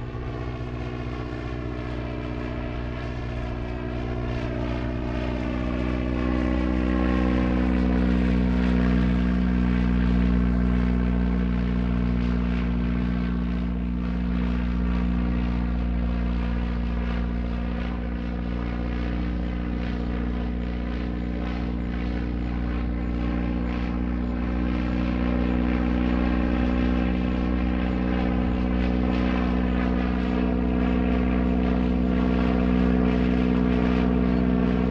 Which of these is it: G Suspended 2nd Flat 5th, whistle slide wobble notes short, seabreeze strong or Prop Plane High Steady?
Prop Plane High Steady